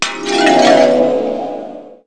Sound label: ball